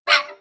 Lazer Collision; Angry Birds Space

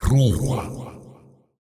Hit Vo; Dumbledore

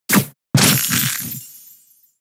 Icicle Spear 1hit; Pokemon; gen 6; XY; ORAS

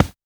run carpet

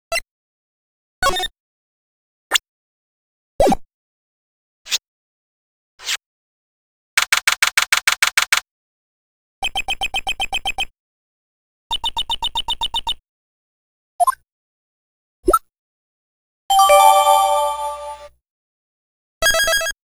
Sound Effects - System Version; Gyakuten Saiban; Reversal bonus disc

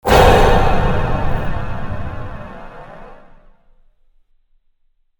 train hit; cry of fear

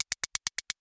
map events appear many

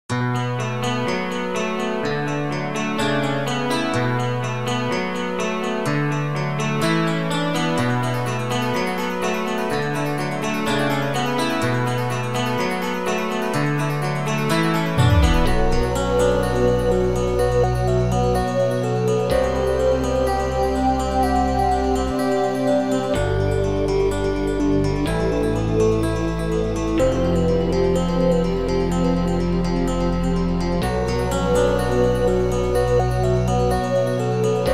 Streets Conversation; NYC

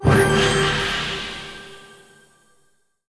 Level Up; portal quest android

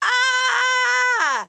Scream; devour 2021 gamerip pc macos

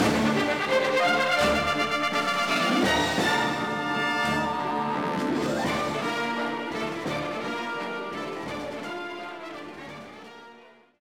Music Battle; Chaotic: Shadow Warriors; NDS

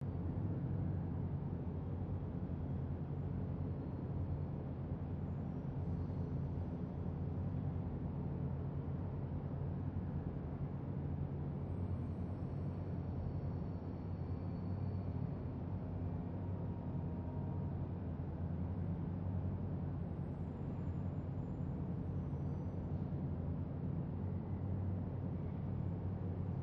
Hangar amb

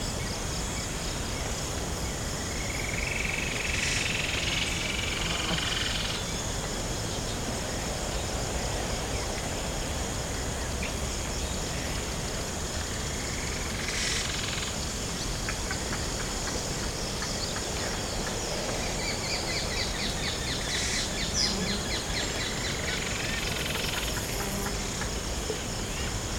Ambience Jungle; angry birds rio

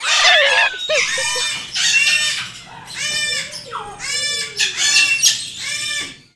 Level Failed Birds; angry birds rio

